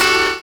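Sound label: SAX N GUITAR; Univers Sons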